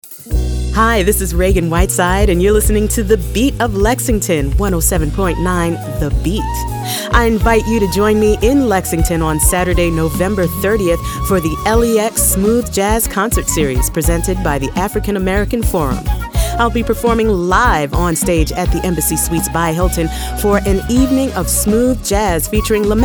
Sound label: Smooth Jazz; The BEAT